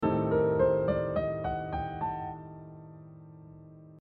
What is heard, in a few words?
locrian #6; harmonic minor scale and modes